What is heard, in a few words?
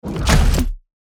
Shooter fps game menu ui clear